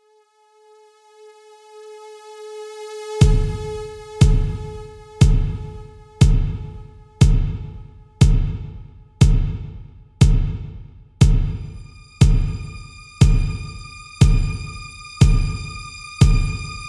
Music Loop Stem; gamerip; Zombie Army Trilogy; PS4

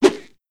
Throwing Knife (Thrown)

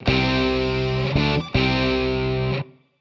Music Character first intro

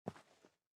SFX step rock

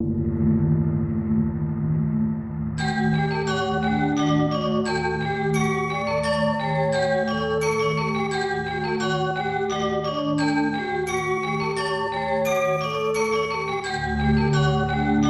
Ceremony; Secret of Mana; snes

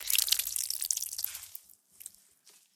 Blood Splash

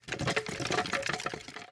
bone pile movement

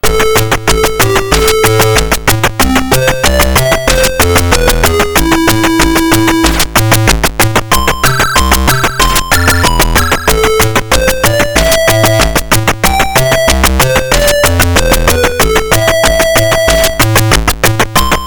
Fencing; Summer Olympiad; Atari ST

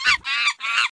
Nature Crit Stop

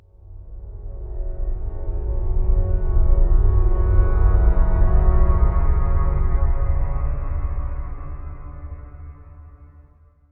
amb organ; Blackfaun

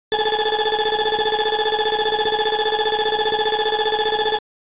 Ending overcharge alarm; game sound effect; libble rabble

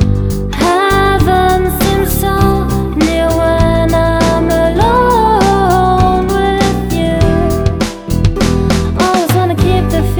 Guitar tape

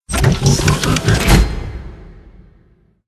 Boss Dwarf Throne; Dwarves

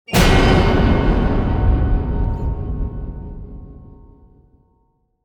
Stinger Spotted

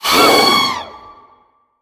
Raptor attack; ice age scrats nutty adventure

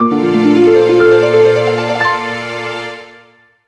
(Jingle) Fanfare

